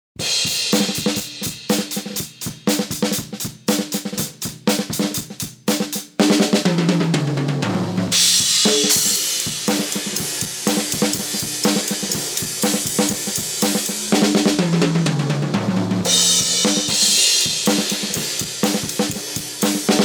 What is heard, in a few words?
HIGH PASS 220.0Hz; MASSIVE